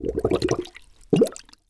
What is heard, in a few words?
Liquid Water Glass Submerge Tall Glass In Water